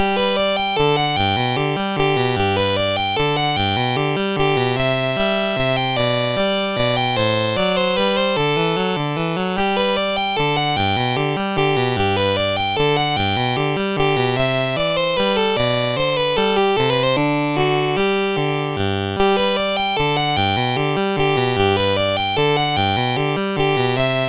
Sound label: Minuet in G, MOS6581R4; Digger